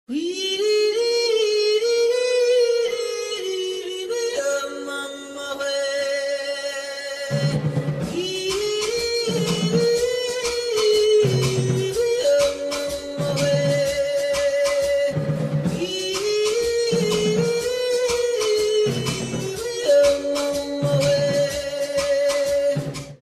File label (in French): lion intro, chorale